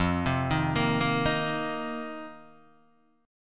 F 7th Suspended 4th F, Guitar, DADGAD

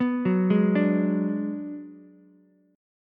B Minor 6th B, Ukulele; Bm6 arpeggiated; JGuitar

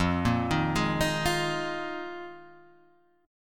D sharp Suspended 2nd Suspended 4th

